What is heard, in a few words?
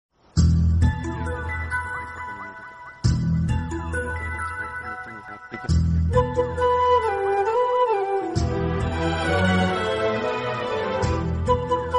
bgm ringtone music ringtone; okkadu